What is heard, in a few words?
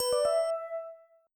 notification